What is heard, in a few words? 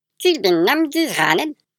ELVES SOLO; the-christmas-chronicles